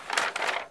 page turn

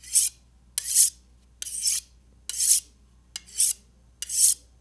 sharpening knife

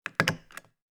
stapler

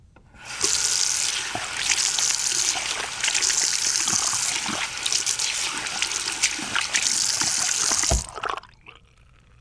hand wash

